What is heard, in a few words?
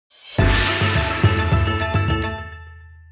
win; plentydragons